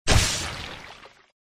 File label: Sfx Hero Dead; Mighty Knight 2 Online